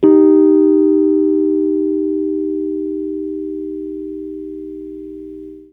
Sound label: Chords Hits; E-MU Producer Series Vol. 8 – Platinum Phatt; EP Chords Hits